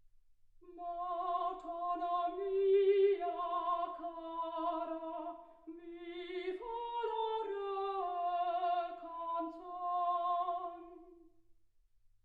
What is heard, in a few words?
soprano